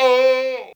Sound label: VOX; Zero G; Man Machine